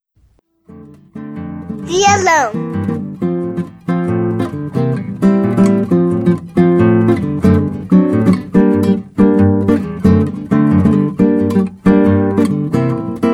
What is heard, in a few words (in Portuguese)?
VIOLAO